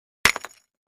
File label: Brick Hit Hammer